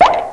plop

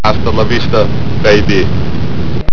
BABY

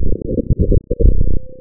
ZAP

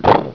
BOX CRASH

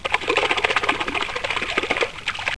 little, water

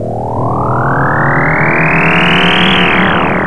spin

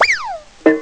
weedonk

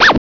SCRATCH; HIGHTECH

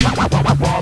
SCRATCH

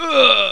dead moan

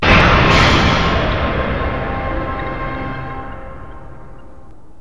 ABYSS

